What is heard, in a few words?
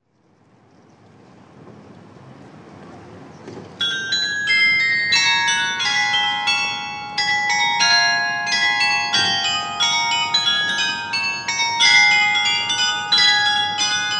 carillon